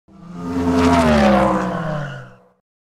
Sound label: Car Pass; Varooom 3D; GBA